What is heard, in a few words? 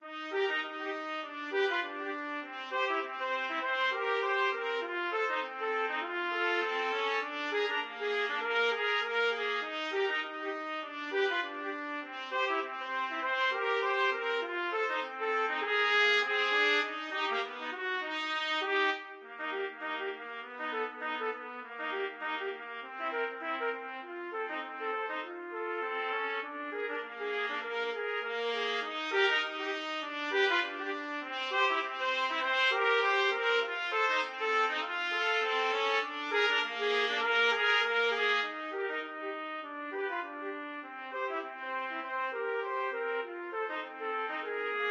silver sand rag trumpet duet